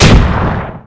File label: Attack; Eft